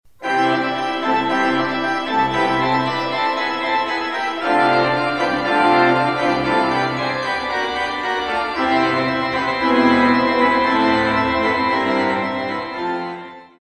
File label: toccata F organ; Classical Duo